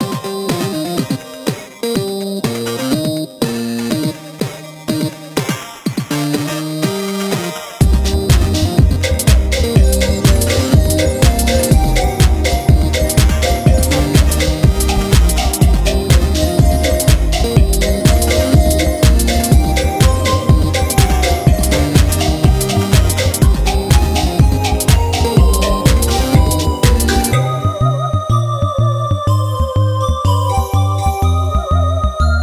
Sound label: Synthwave, Deep Blues